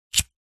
household plastic flint cigarette lighter flick single; zapsplat